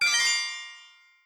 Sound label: Get achievement; Touhou Jitsuzaisou Dream Logical World